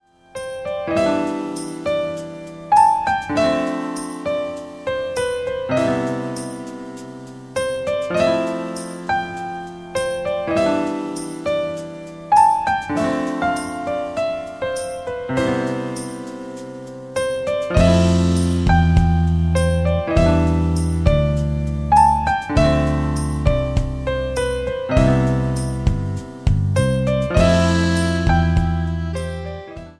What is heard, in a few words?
(Advertising BGM); MIUI12